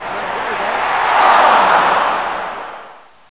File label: Shoop Close Call; Intel; Createshare; PC Camera Games